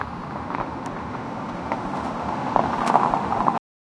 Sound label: car wheel rolling on asph; asphalt